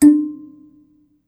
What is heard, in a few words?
BASS KALI, African Melodic; World Combos; E-MU Producer Series Vol. 7 – Old World Instruments